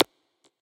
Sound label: risk mouse click; gambling; Burning Reels; wazdan